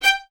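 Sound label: Violins G 5 staccato left; Miroslav Vitous; String Ensembles; 11 Violins; 11 VS Stacc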